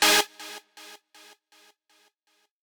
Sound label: lead